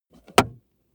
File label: plastic plate crevice click